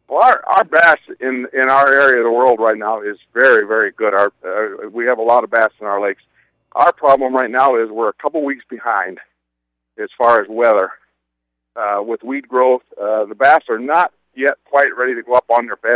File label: Bass Opener